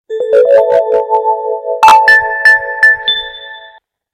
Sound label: Chilled Sms